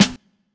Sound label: KIT, Dance Mega Drums, HIP; Best Service